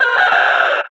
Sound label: Death; Warframe; Lasrian; Battle Barks